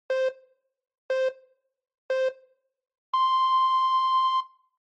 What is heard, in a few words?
Countdown